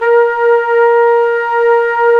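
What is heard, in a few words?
WND, Hybrid Winds, Flute & Flugel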